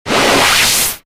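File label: mahou on, magic sound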